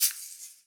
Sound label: grow single